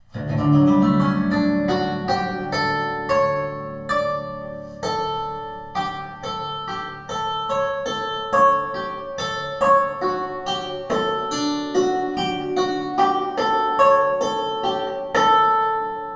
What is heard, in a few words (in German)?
Pentatonik Hemitonische Beispiel; Martin Kucera